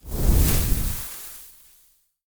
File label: Sizzle